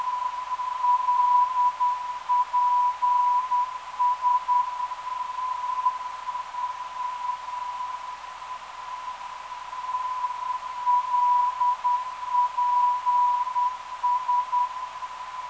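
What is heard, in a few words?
403kHz, non-directional beacon; LPS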